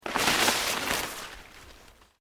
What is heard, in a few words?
Underbrush Dead Move, Rustling